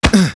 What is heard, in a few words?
Medica damaged